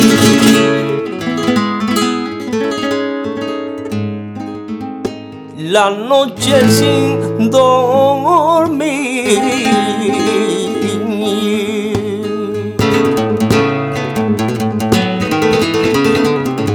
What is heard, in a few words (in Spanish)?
Solea, flamenco